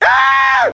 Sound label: homer scream